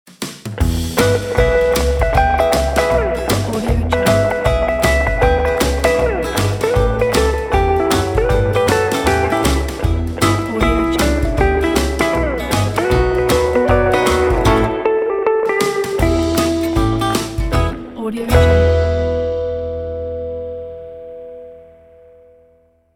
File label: 50s rock logo; applehillstudios